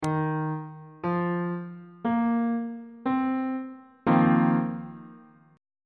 e sharp dominant 7th suspended 4th 3rd inversion on bass clef